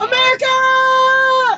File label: toyota pickup jump